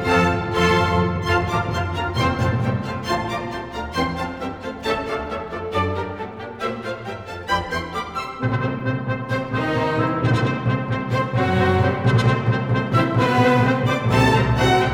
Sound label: Clarinet Concerto Rondo Allegretto; mozzaik dither; dithering algorithm